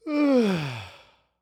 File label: Human Male Sighs Tired Sigh